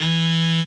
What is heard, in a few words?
cello